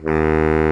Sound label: BARI SAX D#2; Greytsounds VOL-1